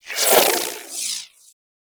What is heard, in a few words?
Overcharged Gyro Move; Warframe; Warframes; Gyre